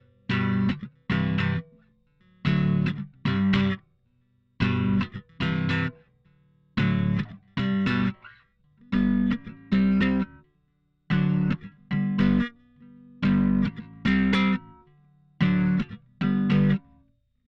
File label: Guitar